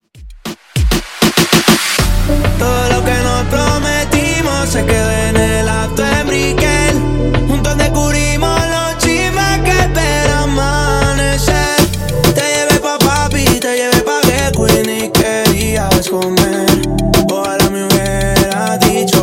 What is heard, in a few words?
98Bpm